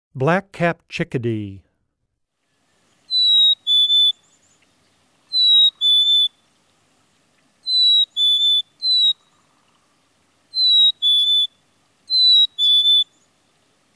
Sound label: Black-capped Chickadee, Wildlife Sounds